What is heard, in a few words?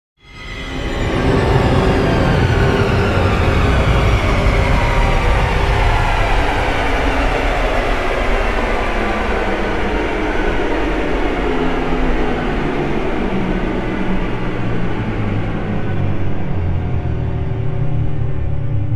Orchestral Faller; Epic Tools vol. 1